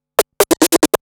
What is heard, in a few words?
Scratch Fills 140BPM